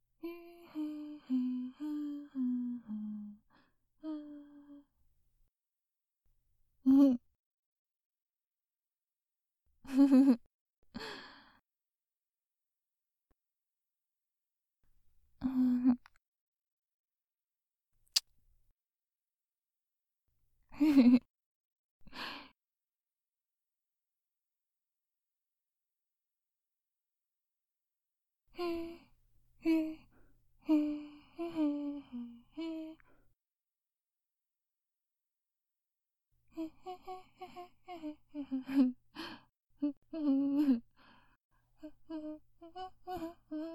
きまぐれキス; 本編2(キスパート)

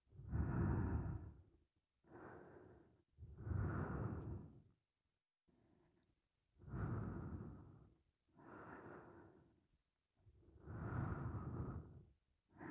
寝息; 左右反転・授乳音あり版

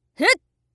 【攻撃】ふっ; 戦闘

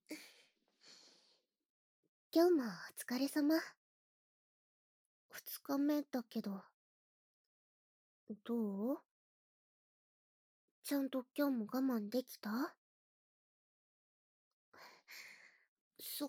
お耳さわさわ、胸をくりくり; オナ禁2日目の夜

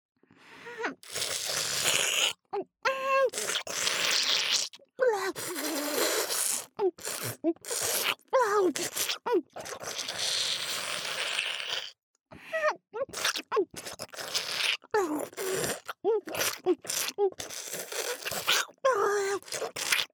バキュームフェラチオ（激しいVer）【ループ】; ループトラック

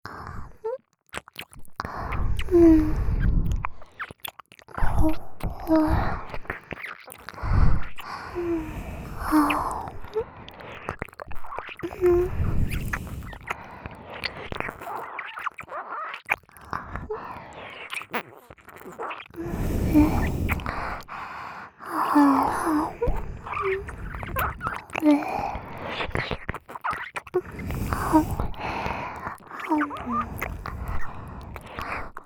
両耳舐め; L副会長・R副会長

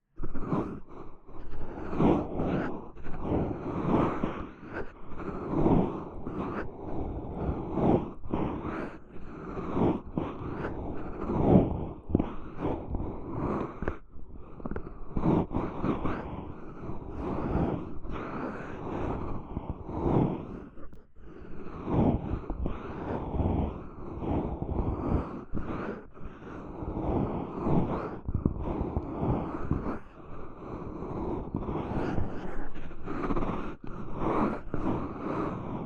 素手 で お耳 を マッサージ する 音; 耳かき音玉てんこ盛り！！03～声なし就寝用音源～

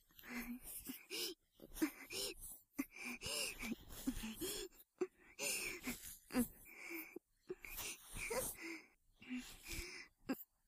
ループ耳マッサージ（右立体版）, ASMR

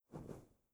布ズレ, 効果音

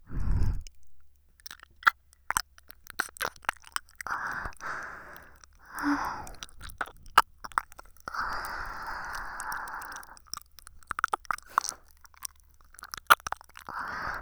耳舐め; おま○こしよ♪

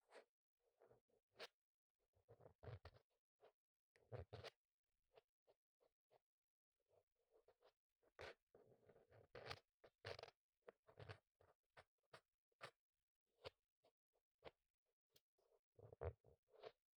竹耳かき 外; 3DIO; 耳かきに関する音

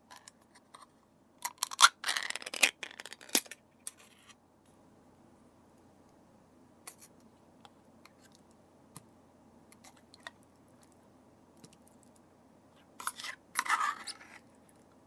缶を開けてメス犬のお皿にエサを入れる音; エサの時間1; メス犬奴隷

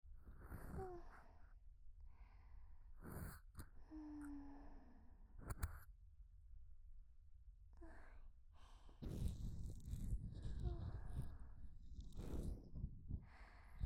耳かきループ右

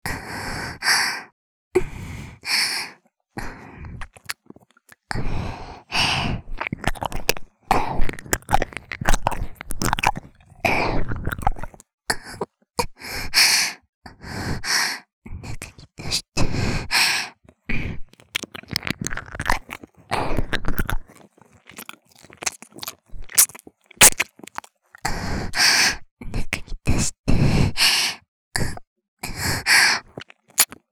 中に出して 耳舐め喘ぎ

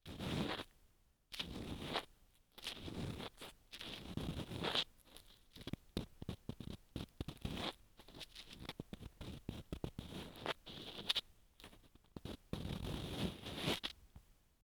耳かき R (摩耗紙ヤスリ＋耳かき); 夏実の耳かき15

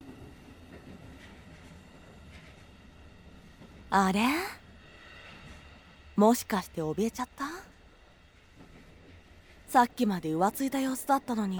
電車の中でシコシコ; 電車の中でシコシコされて…

